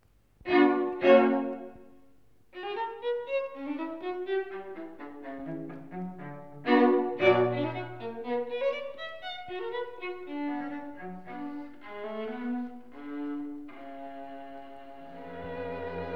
String quartet in C sharp minor, Allegro moderato - Adagio; Op.131-iii